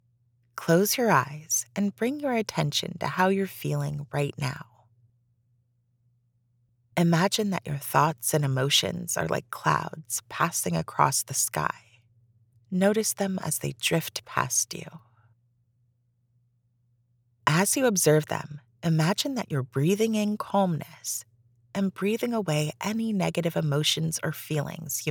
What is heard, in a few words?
breathing